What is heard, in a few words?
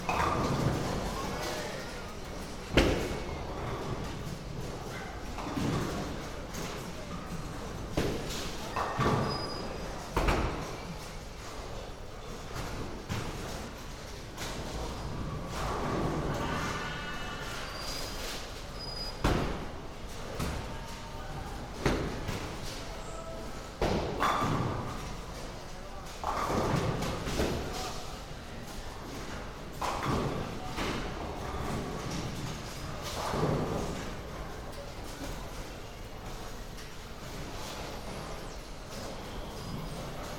bowling alley